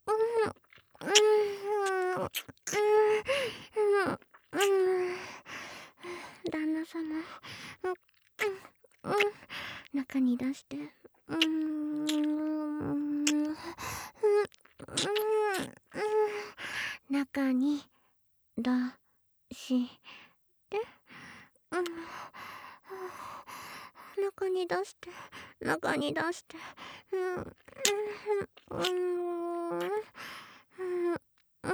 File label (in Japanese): キス